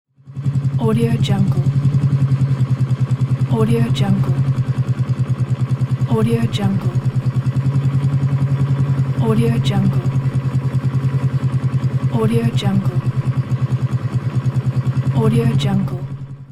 motorcycle idle; urban sounds

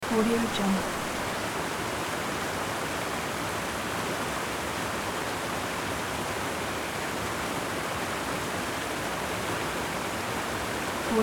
river noise persistent splashing; nature sounds

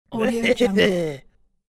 cartoon caveman female laugh; cartoon sounds

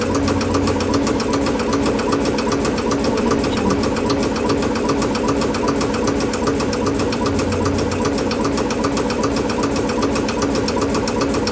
pumps oil arm knock refinery

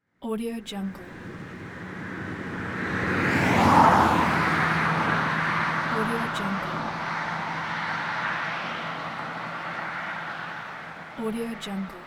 pass by car rural road; urban sounds

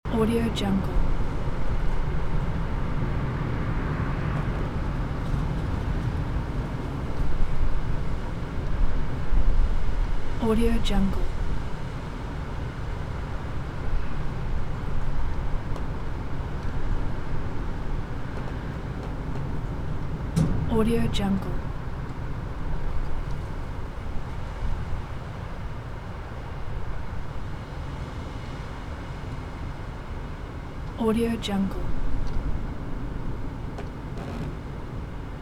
strong wind on countryside yard loop; nature sounds

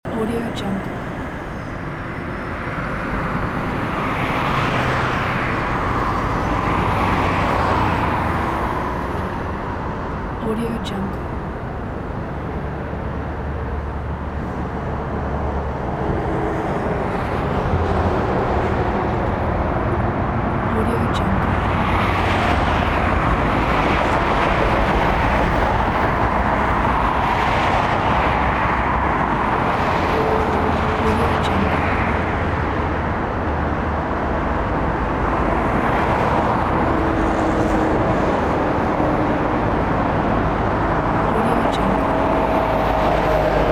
motorway traffic short loop; urban sounds

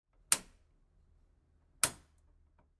bathroom light switch; interface sounds

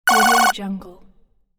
interface ringtone vibrato short; interface sounds

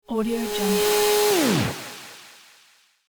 transition mechanical stop reverb; transitions and movement

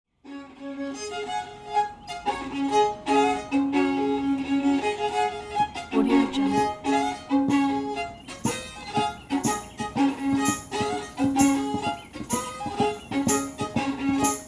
colonial american traditional fiddle percussion; transitions and movement

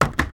wood hits wood hits light two creak

